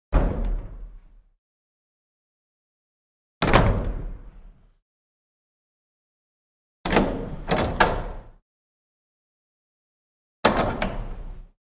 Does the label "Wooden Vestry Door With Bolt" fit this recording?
Yes